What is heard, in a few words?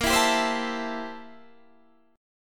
A Minor 7th Bb, Am7/Bb chord; Am7/Bb strummed; JGuitar